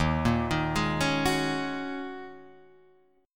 D sharp Minor 11th D sharp Guitar Open G, chord; chordshape

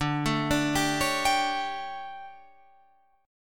D Major 7th Suspended 4th Guitar Drop D, chord

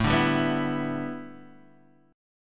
A 7th Suspended 2nd Suspended 4th

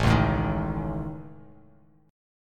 A Major Flat 5th, Bass Standard; AMb5; strummed; JGuitar

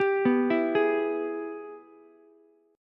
Ab Minor Major 7th Sharp 5th chord, Ukulele; arpeggiated; 12,11,12,11; JGuitar

